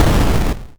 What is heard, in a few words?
explosion Crunch; deepSpace